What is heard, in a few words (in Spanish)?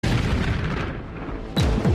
explota